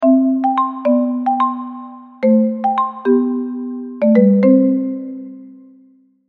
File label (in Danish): jingle lang